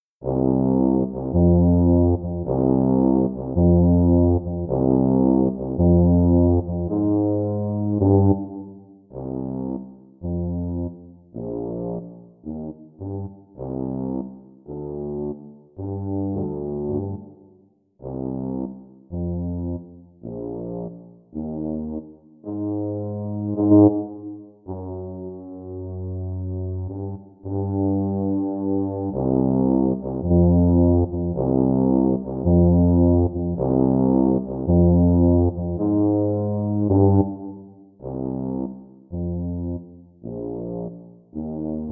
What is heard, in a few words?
tuba; holiday brass